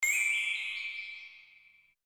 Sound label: impact